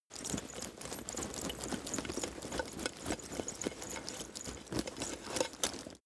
leather metal movement soft fast sequence

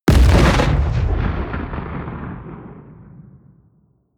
bomb fragmentation close

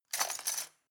cutlery plastic multiple drawer place

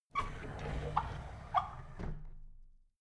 drawer big squeak close soft

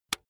razor attachment retract

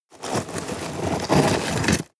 scrape rocks hard

